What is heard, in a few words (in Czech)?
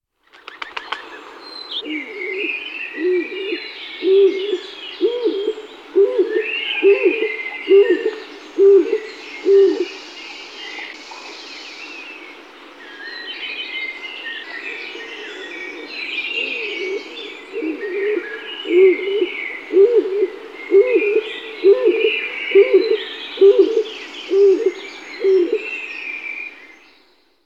Holub doupnak Columbia oenas